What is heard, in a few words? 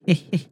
hehheh